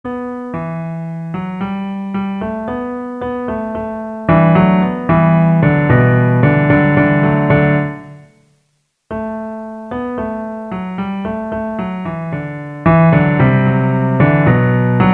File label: Santianna Piano Bass